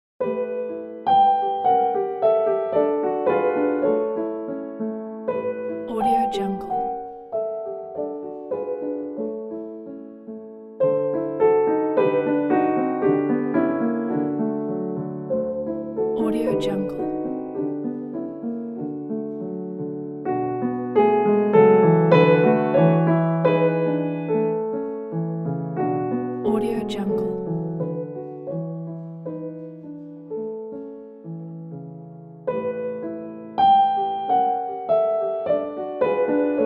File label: Schumann's Kinderszenen No 4 on piano; Pleading Child